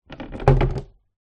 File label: sport surfboard longboard down on hard ground